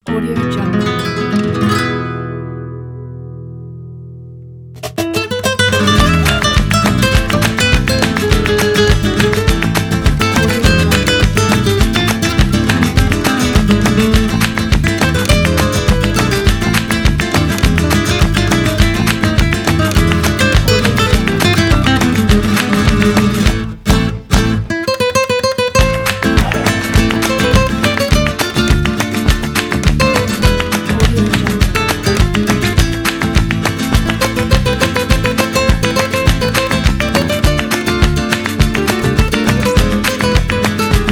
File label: spanish rumba